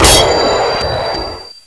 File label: attach, waste scanner; npc; hl2mp